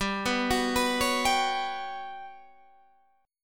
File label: G Major Sharp 11th G, Guitar Standard; GM#11 arpeggiated